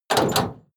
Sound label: lock metal door